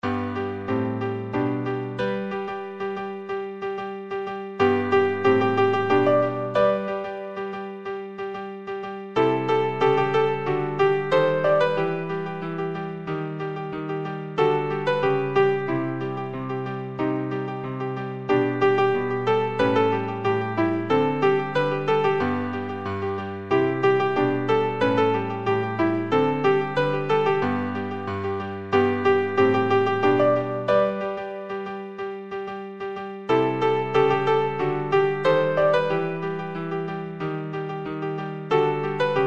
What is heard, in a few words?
instrum